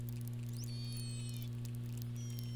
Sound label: Globicephala melas